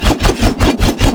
Wolf Axe Throw Loop; Grineer Wolf

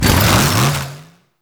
Chain Saw Tonfa Accent Rev Stance; Warframe; Grineer

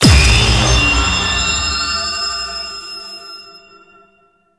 Enemy Spawn; Warframe; Duviri; Dax

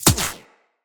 Shield Impact; Tenno

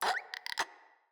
Infested Crawler Breath, enemies; Crawlers

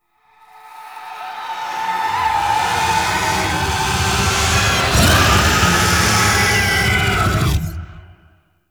Angel Song Fury; Zariman

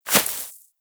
Rodent Hop Step Rear Grass; Warframe; Forest Rodent; Foley

